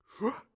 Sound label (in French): ouverture bocal; Fourniture